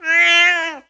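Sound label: Cat Domestic Meow Angry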